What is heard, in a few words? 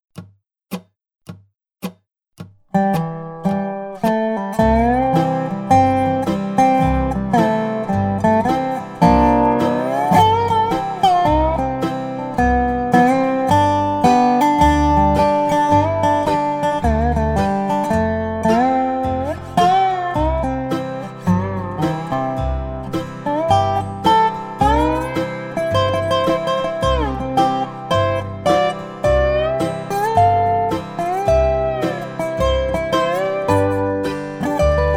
Key of C Dobro and backing track